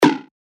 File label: Moku, percussion; revealed percussion vol 3